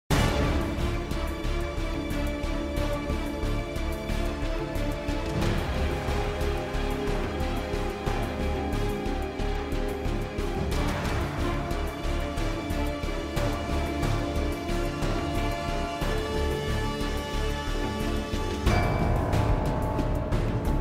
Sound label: BGM Danger Warning; Family Guy Back to the Multiverse; Apm